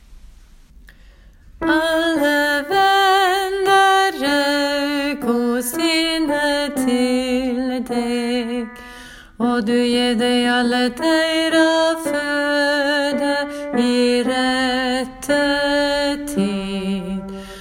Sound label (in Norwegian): bass; alle-vender-augo; åfjord